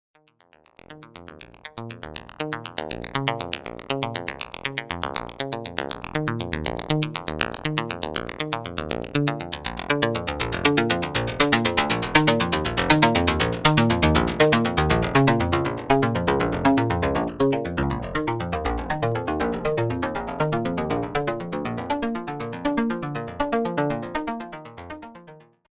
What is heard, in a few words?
OP-1 String